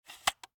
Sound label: Viewmaster, plastic, lever, load; Eiravaein Works; Vaeyan IV